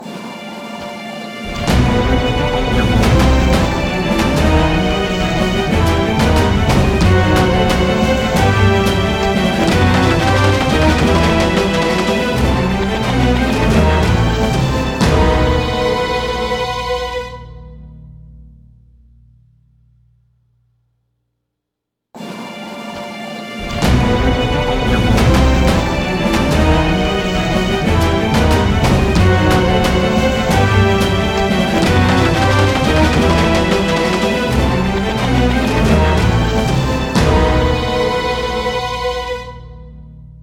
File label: Outro Music; Super Meat Boy; PS Vita